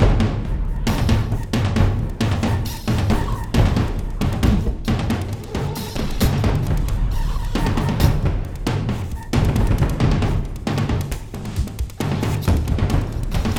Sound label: Drums Action Fast; Crysis